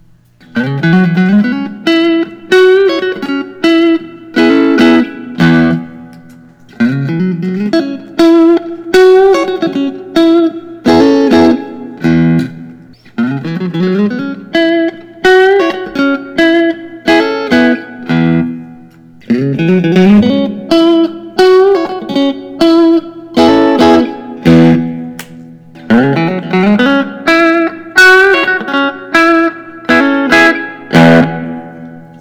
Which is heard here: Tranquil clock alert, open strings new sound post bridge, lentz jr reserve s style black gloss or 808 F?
lentz jr reserve s style black gloss